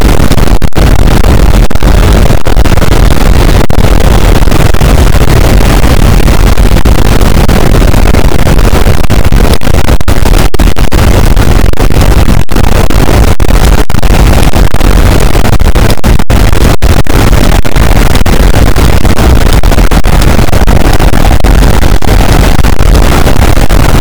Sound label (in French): cachalot positif, boat; Physeter Macrocephalus